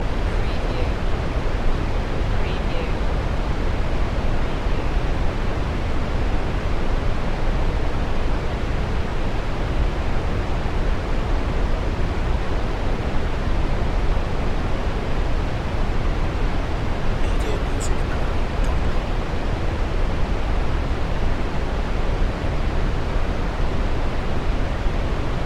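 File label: Waterfall Large Distant; Sound Effects Bible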